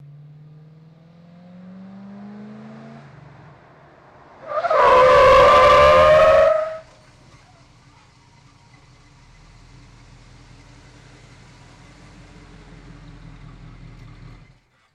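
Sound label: Blazer Fast Tire Skid, chevy blazer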